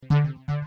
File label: hover